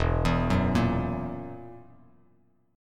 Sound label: E Suspended 2nd Flat 5th E down Bass Standard; arpeggiated; JGuitar